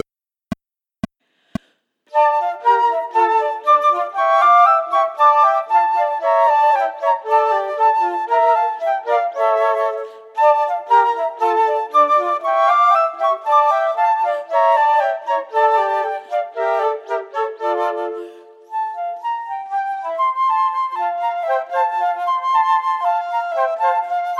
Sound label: Quartet minus 4th part; Rameau